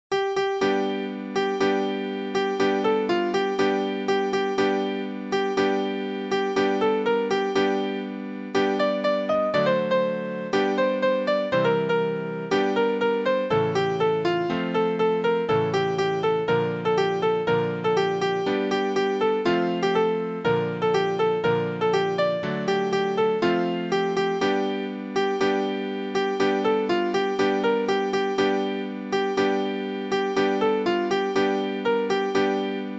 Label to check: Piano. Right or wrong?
Right